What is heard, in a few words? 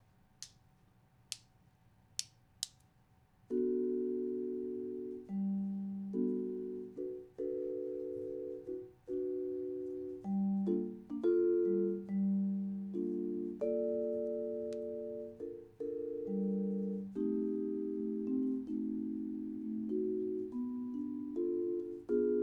chords, vibes